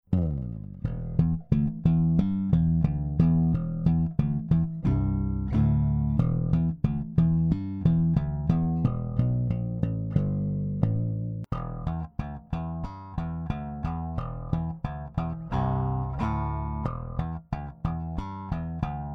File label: Ampeg 4001 MID 1 2 3 Boost